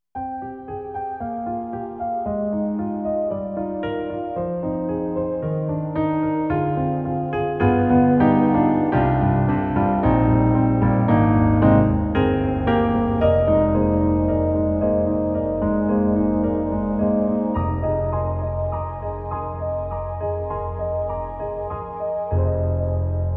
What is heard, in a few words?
warm vox piano, EDIROL SD-90